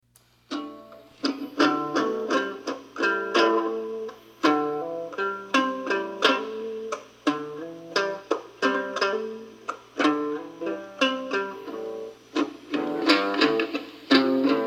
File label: Blues Jam Riff (Acoustic)